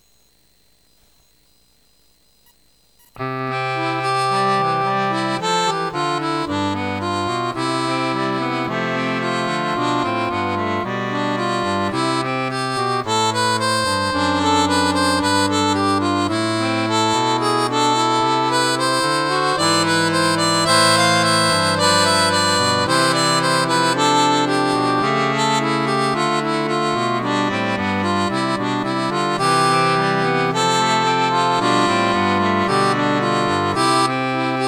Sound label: accordion